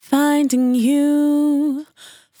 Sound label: 100bpm Bm, Velvet Vocal; Zero-G